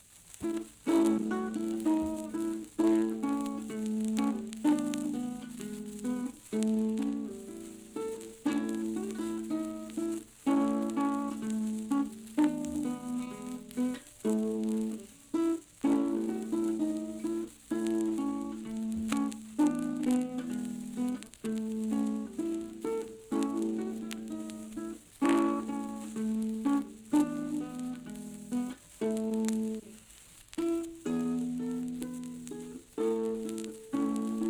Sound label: peter playing the guitar; the p g klopp story